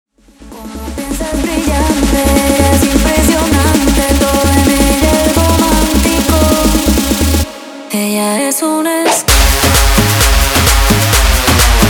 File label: tribal remix 130 bpm